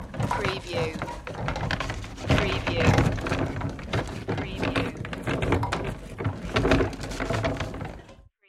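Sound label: Horse Eating Pail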